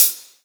closed high hat sound f key

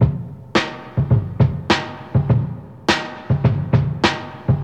103 bpm 90s drum loop a sharp key; drum loops

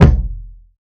reverb bass drum one shot f key

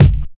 smooth kick single hit a key; kick drum samples

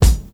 modern shiny bass drum one shot a key; kick

